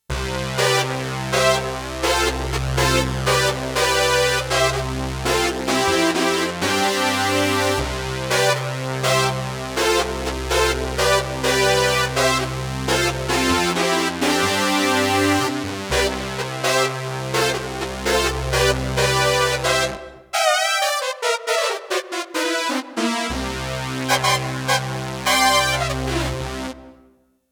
SYN BRASS, Roland JX-1; Factory presets

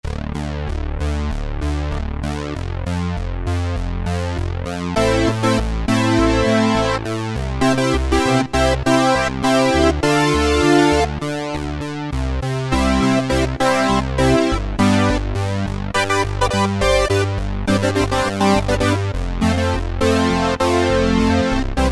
PolySynth, Roland MKS-50; Factory examples

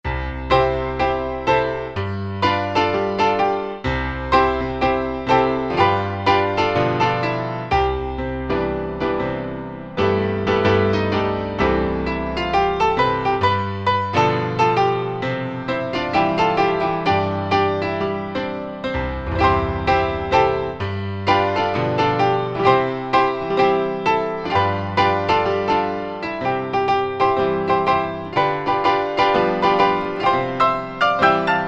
Octave Pno, Alesis ZA Stereo Jazz Piano

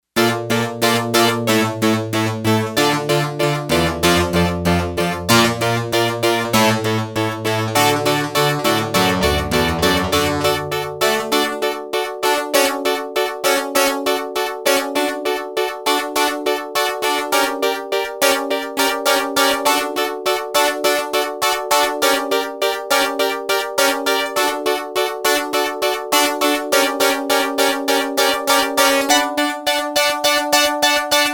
Wire Strg A, Yamaha TX802; Factory Patches; Voices

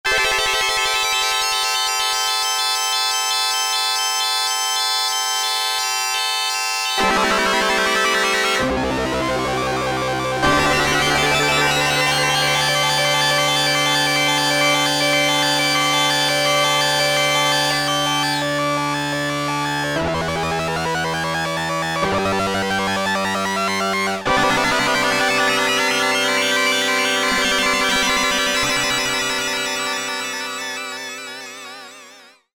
DECELERATE, Oberheim OB-8; Factory Patches

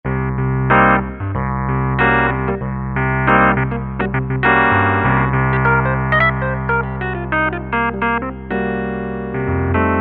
Pf Suitcase 73, Yamaha EX5R; Factory presets

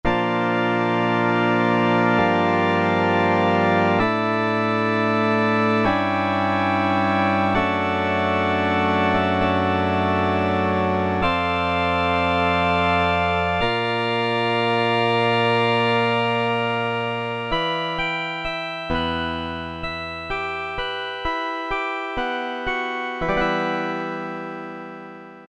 Percussive Pipe Organ, Korg Poly-800

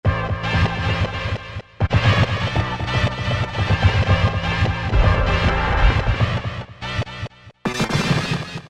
The Hornie, House Collection; Roland SR-JV80-19 House Collection

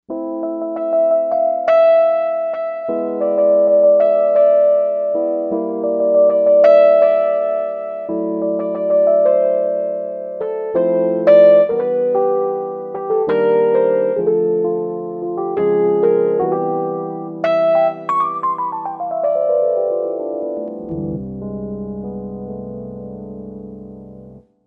70's Piano, Korg M1Rex; Factory Patches

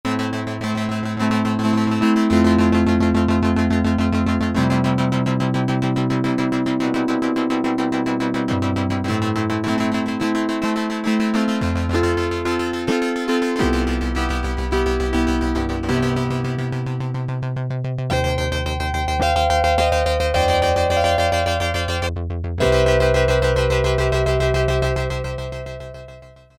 REPEAT, Oberheim OB-8; Factory Patches

